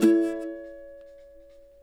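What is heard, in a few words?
Country Mandolin Intro, Pro Tools Essential Loops; Digidesign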